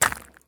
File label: sprint glass; Escape from Tarkov